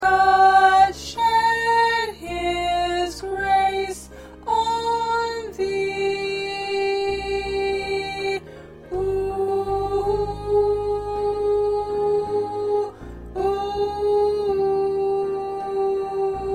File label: 1st Alto